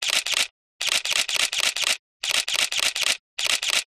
camera dslr take picture multiple fast fashion shoot nikon d5200